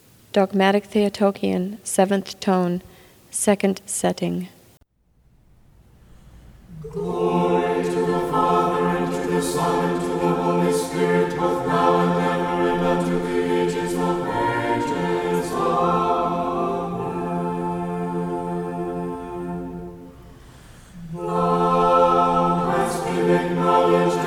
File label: Dogmatic Theotokion Tone 2; Setting 2